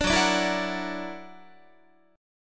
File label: F sharp Augmented 7th, down, Guitar; strummed; F#+7; 17,14,17,14,14,17; 4A,4E,5A,5D,5G#,6C#